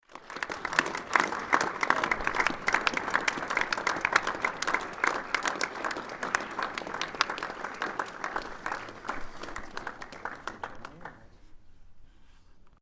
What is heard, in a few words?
cedar applause